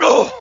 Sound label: MINOR PAIN; cash